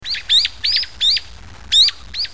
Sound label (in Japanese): hiyodori